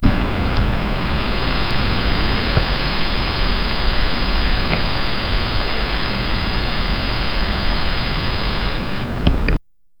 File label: quick air